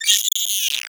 egg pain; player; Portal 2; eggbot

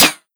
flavor metal, bullet impacts; Half Life Model Pack

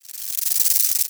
rattle warning layer, headcrab

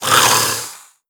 yawn, headcrab, creatures; headcrab classic; vox

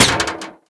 bullet metal grate; physics

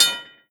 train crossing bell; world misc

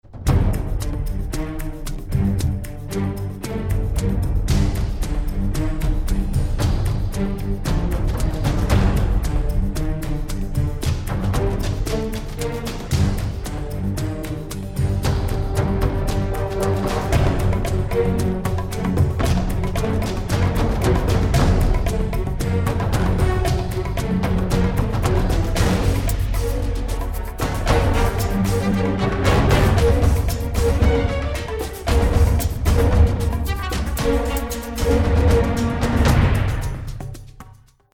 bomb planted; valve; csgo

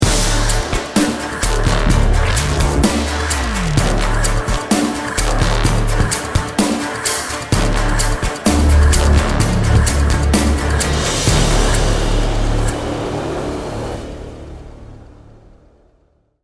start action, music

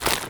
zombie move short, foley; Half Life Model Pack

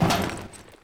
metal grate, footsteps; player

